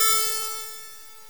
guitar A4; osu

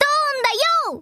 combo break; osu

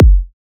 normal hit whistle

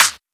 kick

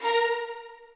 strings

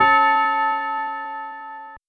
bell D5

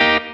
clean Bm7 Staccato

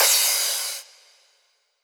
crash

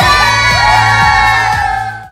yell fade; osu

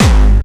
Growl Kick; osu

